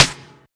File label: Snare; Ghosthack